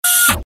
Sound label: servo action style